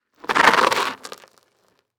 Transportation Tire Skid Over Rock Salt

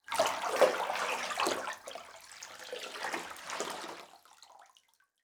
Liquid Water Movement Pour

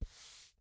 Household Dry Eraser On Dry Erase Board

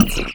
Multimedia Internet CD-Rom Flash Buttons Hard

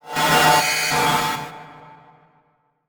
Transition Metallic Warble; Production Element; Title Transition; AdobeCC

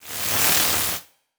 Transition Electrical Surge

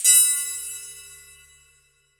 Transition Bell Hit; Adobe; Production Element

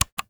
Technology Controller Button Clicking

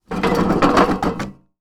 Industry Movements Wheel Roll Down Metal Floor

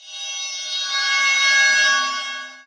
Multimedia Internet CD-Rom Flash Long

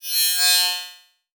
Beep Electronic; Multimedia Internet CD-Rom Flash

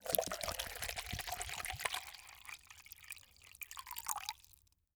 Liquid Pouring In Glass

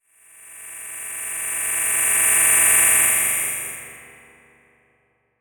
Title Transition Swell; Production Element; AdobeCC

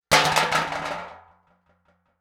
Trash Can Lid Fall To Ground, Household

